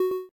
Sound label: TURN; gnome-games